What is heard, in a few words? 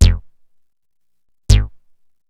HIP BASS, HIPHOP; Rubber Chicken Software Sample Bank